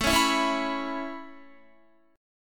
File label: A Major Add 9th A Guitar, chord; Aadd9 strummed